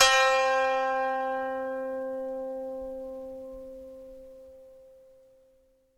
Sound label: koto; Summer Catchers